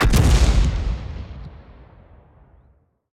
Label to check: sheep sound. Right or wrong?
Wrong